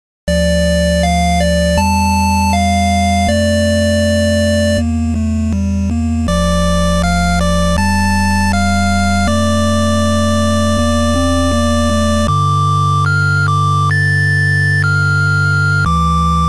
Ocarina, Requiem, 8bit

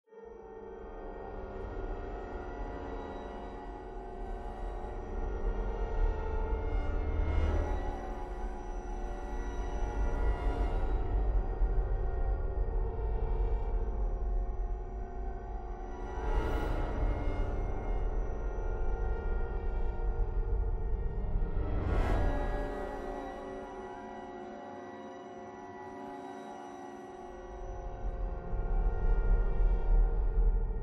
Cave Atmos

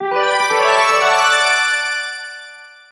Jewel Recieved; Innocent Life: A Futuristic Harvest Moon

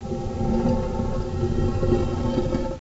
Pedestal Slide; escape the museum; nds

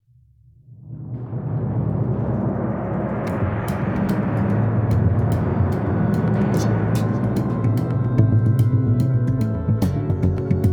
Action loop

